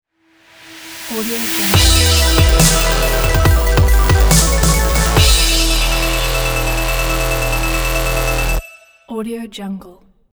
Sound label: show ident